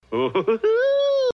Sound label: giggles; Tigger